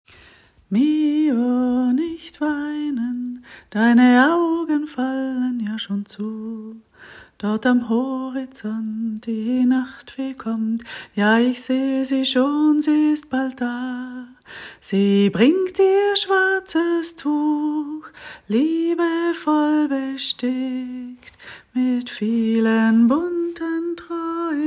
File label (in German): kind Mio nicht weinen Hochdeutsch